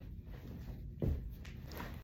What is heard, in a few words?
stomp